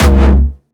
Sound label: Jumpstyle Kick C#2, vengeance essential bassdrums; Jumpstyle Multis; vengeance essential club sounds-3; VEC3 Bassdrums